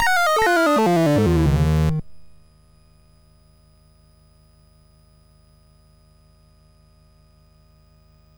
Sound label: Game Music, sid MOS6581R3